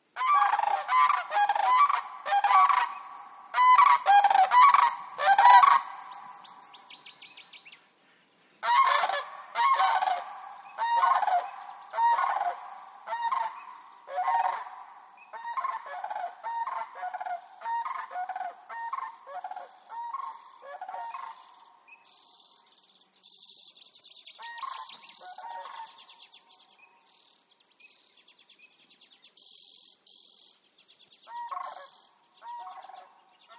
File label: BIRD Sandhill Crane Antigone canadensis